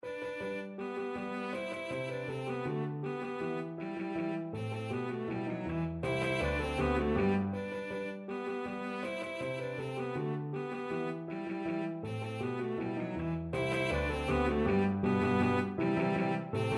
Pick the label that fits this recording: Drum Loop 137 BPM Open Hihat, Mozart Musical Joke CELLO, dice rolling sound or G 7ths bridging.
Mozart Musical Joke CELLO